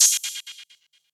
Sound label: Gated Cymbal; musicradar